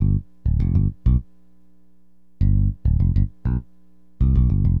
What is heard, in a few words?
BASS, Acid Jazz Funk